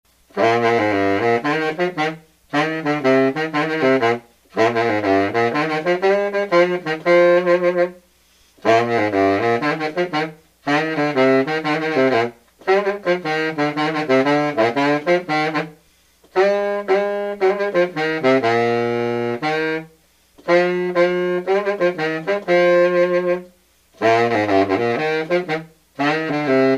Martin Elk Bari B flat

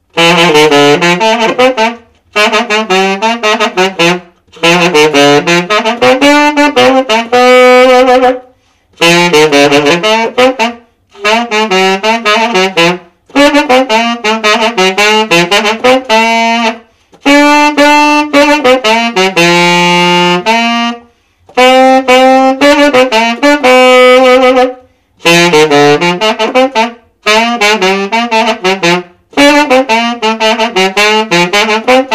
Conn Wurlitzer Tenor Silver Cannon

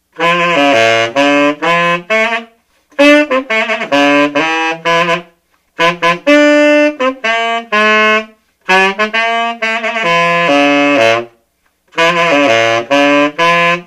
Buescher Gold Bee Tenor

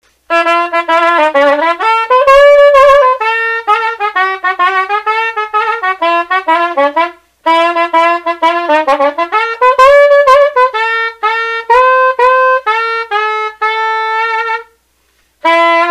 Conn Beauford Sop B Flat Straight Battle B flat

